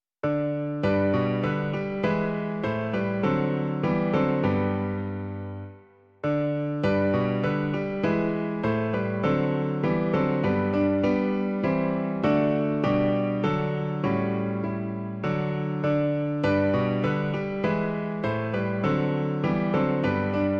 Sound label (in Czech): klavír; Hned v jitře k tobě duši svou; Klavírní verze